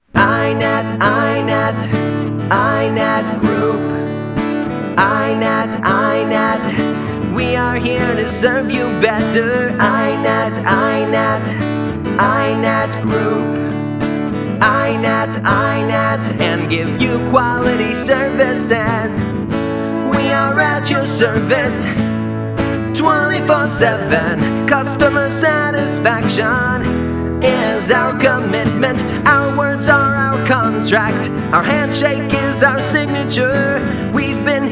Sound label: JINGLE